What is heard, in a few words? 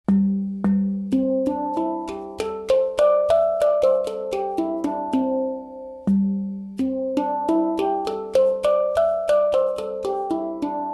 Hang drum Pentatonisch C